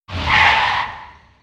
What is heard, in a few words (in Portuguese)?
freada de carro efeito sonoro